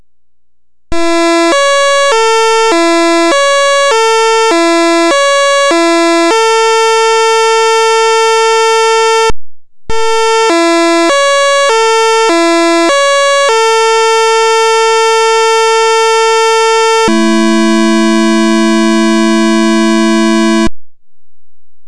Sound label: music horn